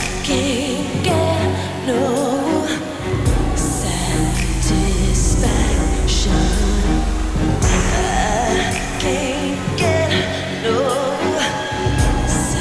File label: pop